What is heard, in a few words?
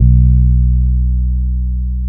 B 2, hood boom, bass; Spectrasonics; Bass Legends CD 1